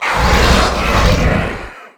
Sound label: Clever Razormaw Death